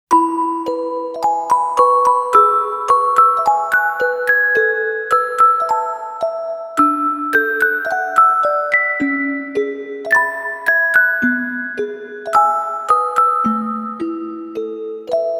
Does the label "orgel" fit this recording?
Yes